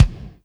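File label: kick Abrupt, Alesis D4; 300 Drum Machines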